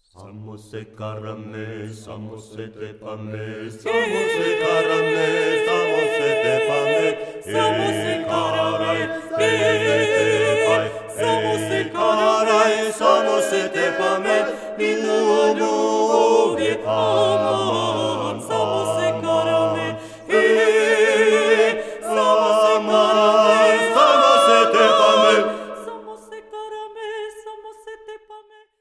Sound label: acappella; Humoreska